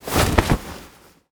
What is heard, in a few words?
cloth movement heavy